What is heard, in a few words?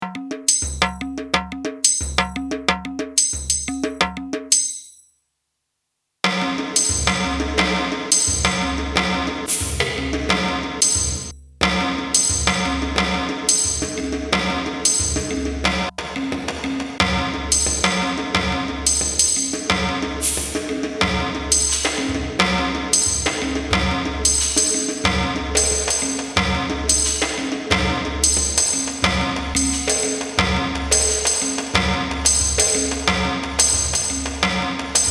Yamaha SPX90 Reverb and Gate